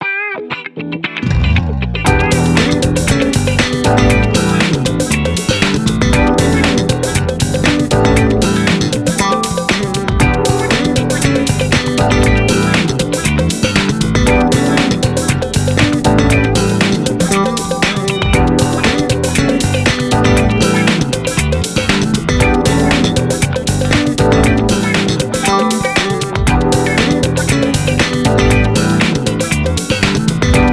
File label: Goal last; Gran Turismo 5